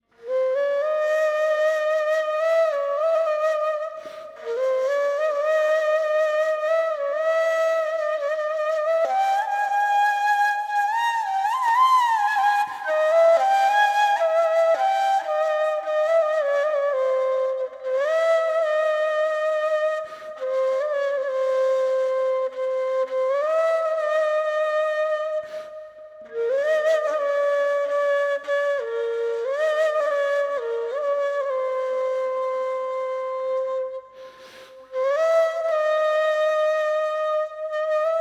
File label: solo kawala